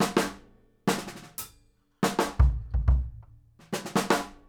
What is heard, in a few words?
FILL